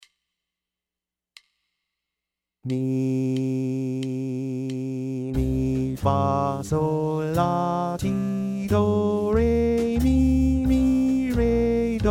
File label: C Phrygian 90